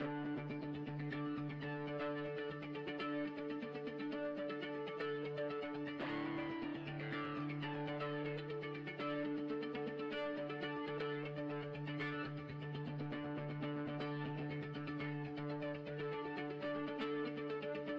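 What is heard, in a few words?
compound rhythm, Rhythm Compound, electric bass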